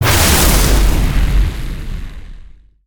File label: Spinjitsu Lightning End Blast